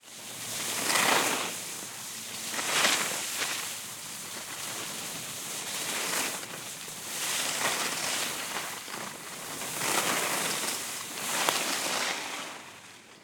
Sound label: Skiing ski downhill turning carving snow ice crunch stop; Matt Script